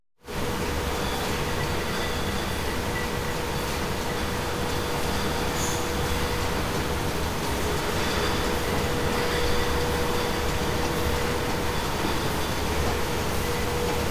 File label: Bottling Plant; KMP Film Video Sound Effects